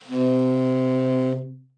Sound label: TENOR SAX; Best Service; Killer Horns